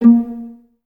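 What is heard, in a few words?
Violas A#3 pizzicato, String Ensembles; Miroslav Vitous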